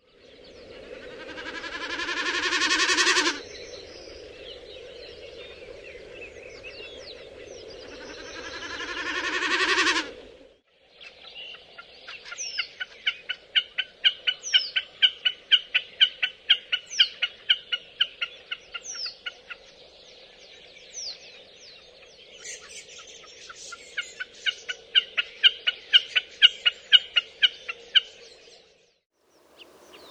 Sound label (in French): Bécassine des marais